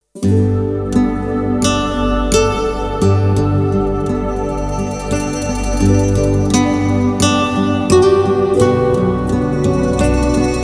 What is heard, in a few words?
Love Legend Theme (Instrumental); Veer-Zaara